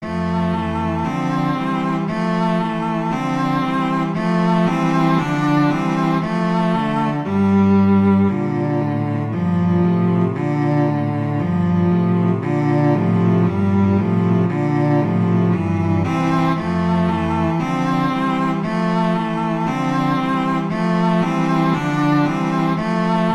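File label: Etude, cello; Popper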